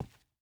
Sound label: footstep boots concrete walk; Rust